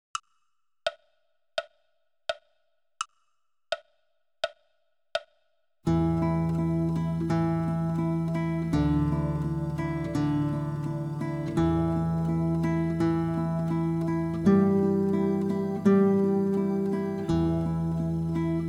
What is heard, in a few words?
mini tremolo; Nicolas de Rigal